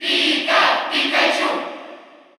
Cheer Russian; Pikachu; SSBU

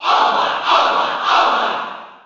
Cheer; Olimar; SSB4

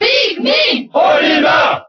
Cheer French; Olimar; SSBB

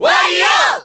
Cheer Korean; Wario; SSBB

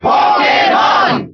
Cheer German; Pokémon Trainer; SSBB

